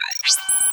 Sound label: Low Battery